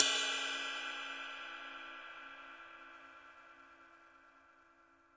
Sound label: big ride bell; single hits; Big Believable Rides